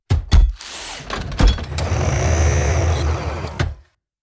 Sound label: exit ship; ships misc; CosmicRage